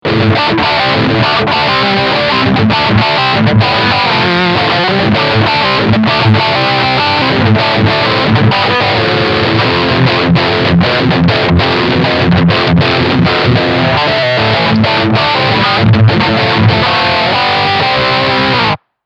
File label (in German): Riff Steg HB und Mittel SC